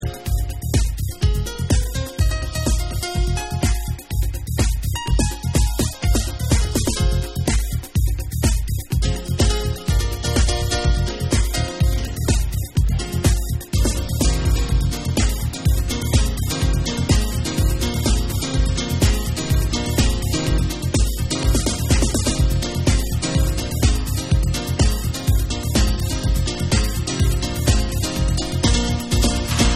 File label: Piano Mix